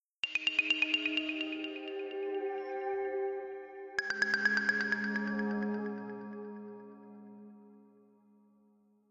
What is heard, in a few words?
Perfect 3d Tune